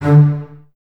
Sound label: Basses D 3, String Ensembles; Miroslav Vitous; LINKS ORCH